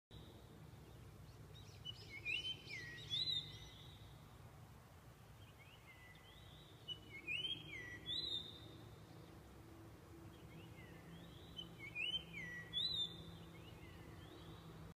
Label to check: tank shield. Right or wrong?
Wrong